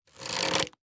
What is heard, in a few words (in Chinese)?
弩快速装填一阶声